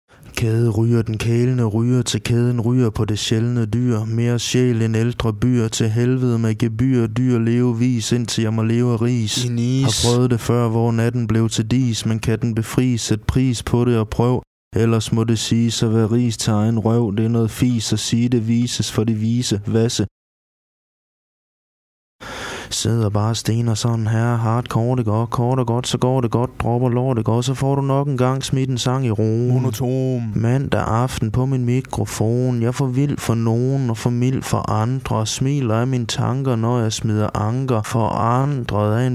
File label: rap